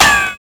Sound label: metal solid hit; projectiles; bullethit; Max Payne